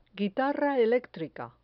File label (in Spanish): guitarra electrica